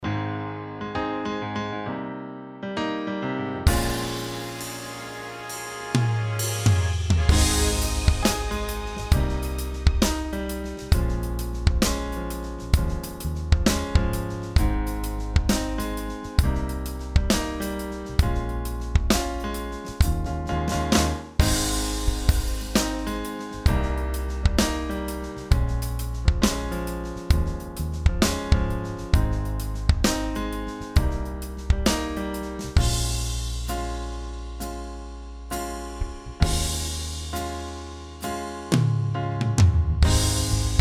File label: americana; surReal